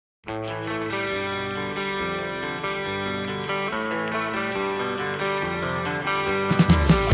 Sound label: cowbell intro; csound